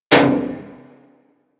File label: Bullet Impact Debris Pipe